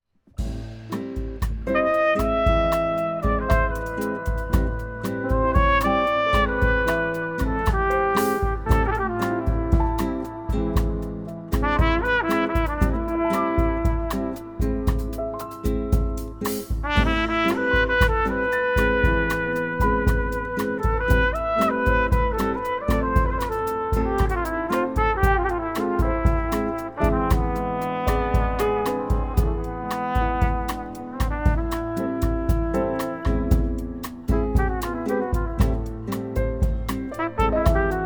Lounge Cocktail Music Trumpet

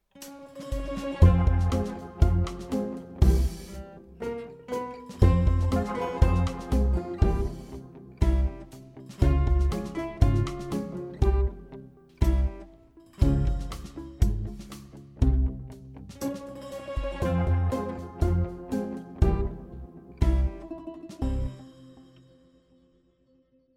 Comedy Guitars Quirky

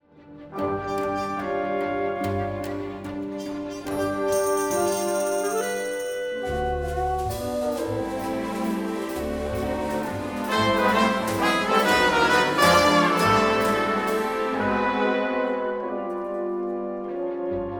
Orch Action Adventure Jazz Comedy

